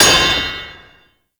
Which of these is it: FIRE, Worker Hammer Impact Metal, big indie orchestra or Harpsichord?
Worker Hammer Impact Metal